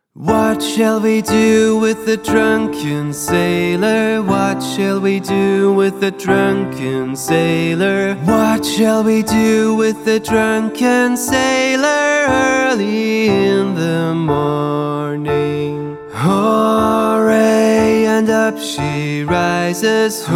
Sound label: Drunken Sailor, Pop Piano Patterns